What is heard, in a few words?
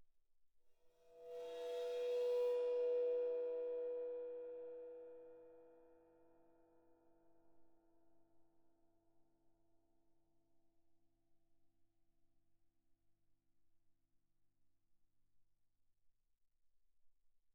21 ride, bow, suspended cymbals bowed; Percussion